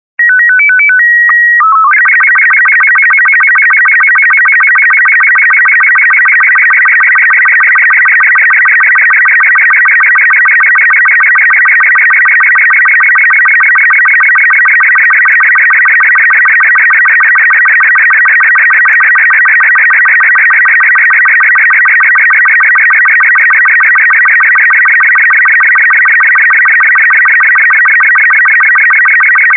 SSTV

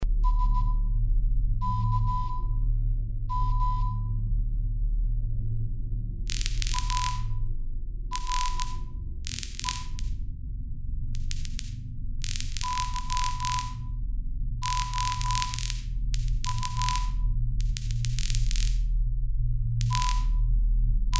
Transmission interception

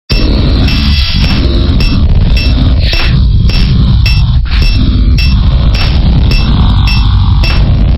Haha

